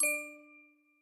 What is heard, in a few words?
lava amount; Skillzgaming; battle Mania Desktop